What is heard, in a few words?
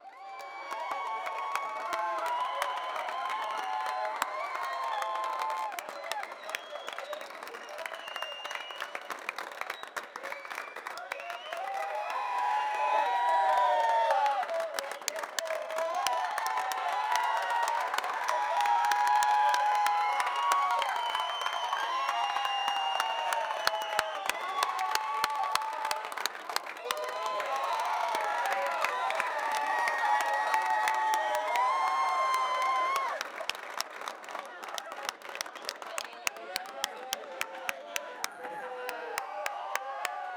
large crowd studio sitcom